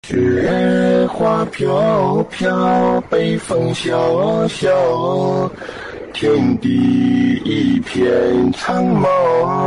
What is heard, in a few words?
autotune